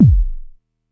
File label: Cwejman S1 - Kick; Murff Kick; DevSnd Cwejman S1 - RD